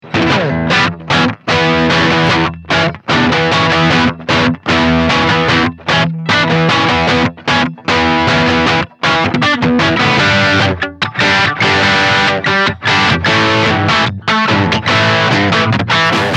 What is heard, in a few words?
Crunch